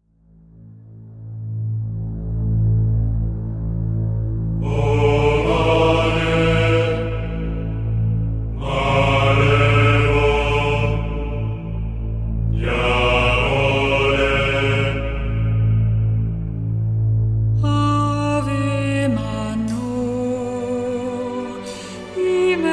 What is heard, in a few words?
Orchestral